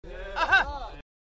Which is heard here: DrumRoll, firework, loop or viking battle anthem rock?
loop